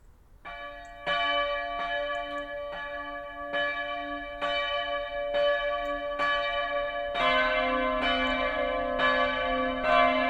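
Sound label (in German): Tonaufnahme f1 a1 c2 d2, mehrstimmige Geläute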